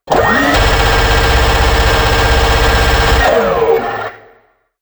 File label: Minigun; game; weapon; Jim Rogers; shroom of doom